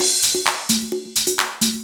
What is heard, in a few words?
Dance Grooves